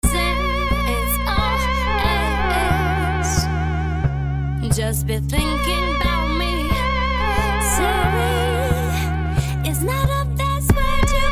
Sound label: Glitch Art; Ueberschall